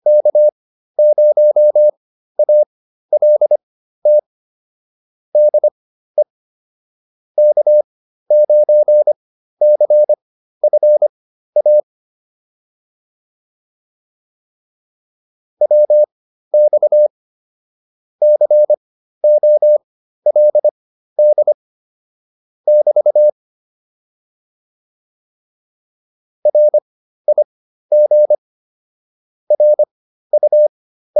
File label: QSO, intermediate practice files